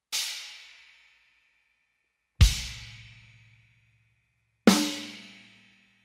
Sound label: MEINL